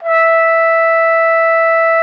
TROP E5, TRUMPET; Xsample VOL-3

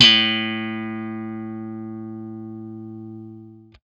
BLUE POP, Guitar & Bass

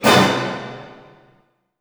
F# DIM FF, ORCH HITS, Orchestra; Sound On Sound; Peter Siedleczek's Orchestra

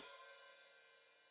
Hat Foot Open, Hi-Hat; Deeply Sampled Drums; Cymbals Stereo

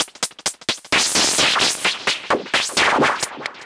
Modular 130BPM

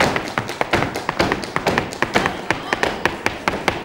STEP + CLAPS, MSTEPS+CLAPS; Best Service; World Colours

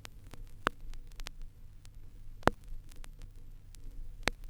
Snap Crackle Pop, Vinyl Noise

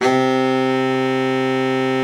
BARITON, BARITON SAX

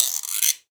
Guiro Slow, Perc; LA Perc; Akai MPC-500

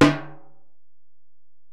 Bell, Alesis DM-PRO

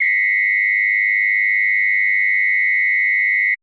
perc, Roland MC-909

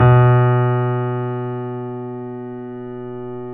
BOSENDORF

